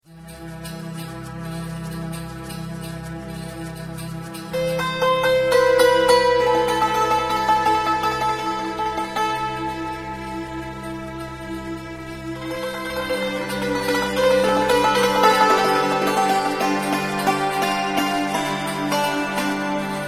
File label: persian tear, Near Middle Eastern